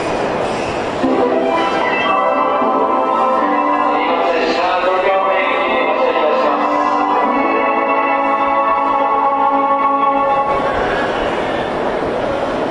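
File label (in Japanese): 発車メロディ, 大崎駅, 山手線; 始発専用です; 渋谷・新宿方面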